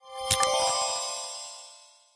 Ui Unlock

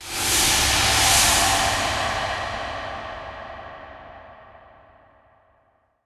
Interface Cue; The Suffering

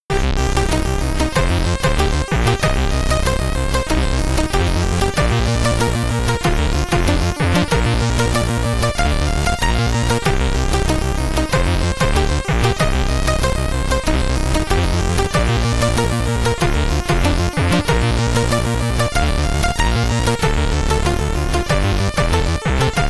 Main Menu (SID Stereo), commodore 64; quadrant

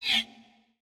Ui Chat Close; portal quest android

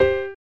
Hit Wall; sanrio world smash ball 1993 snes

